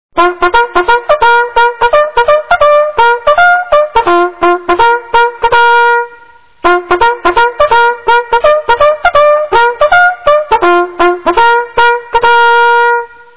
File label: assembly, bugle